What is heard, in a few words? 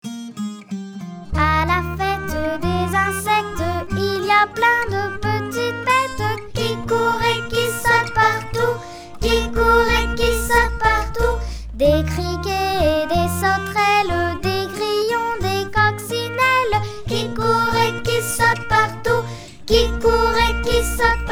SONG